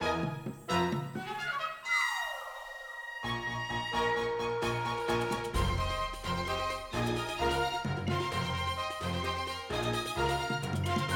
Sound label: Field Battle Ground Hard